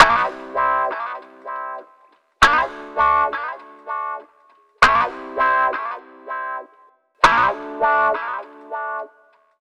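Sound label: guitar 1, 100 bpm, Funk; USB Soundscan vol.41; AKAI; Roots Funk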